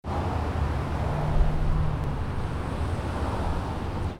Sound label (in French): niveau sonore derriere la paroi antibruit; autobahnlaerm hinter einer laermschutzwand